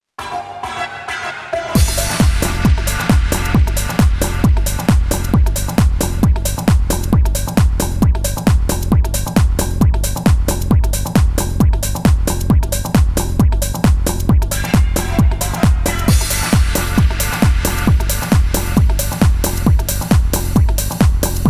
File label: oscillator octaves